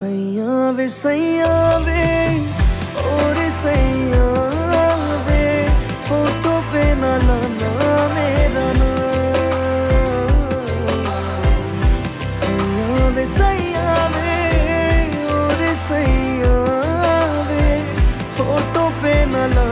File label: Hindi Songs